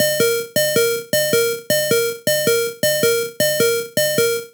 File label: In-Battle Health Low Loop